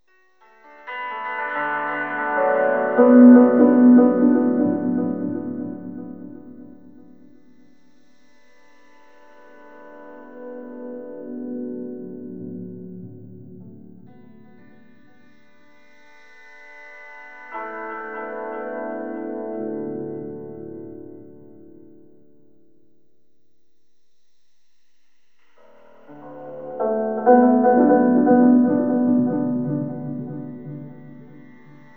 Piano Wave; Sonic Foundry (Sony Creative Software); Slow Silhouette

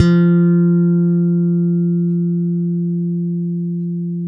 SLAP F 4, bass; Spectrasonics - Bass Legends CD 1; ALL THUMBS

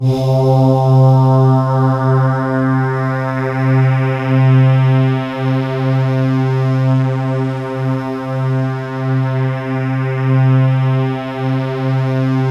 C2, Synth Leads

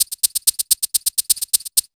127 DRM, Fresh Disco House

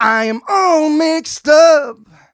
m 127 mel a, Vocals

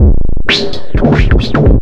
FILTER, Big Beat Drumloops

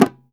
GENDANG F4F, GENDANG DRUM; Spectrasonics; Heart of Asia

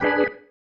Stab One Shots, Organ

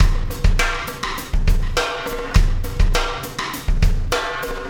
Beat, Loops

